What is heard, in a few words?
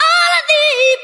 all i need SUNG